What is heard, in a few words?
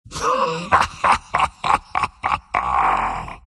laugh, centaur; dota